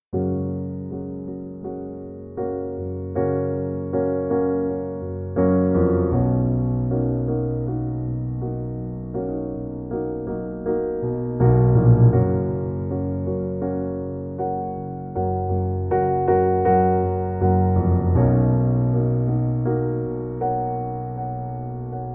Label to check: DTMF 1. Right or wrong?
Wrong